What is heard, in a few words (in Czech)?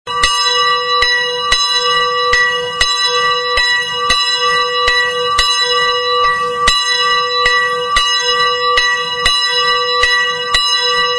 zvonek; habri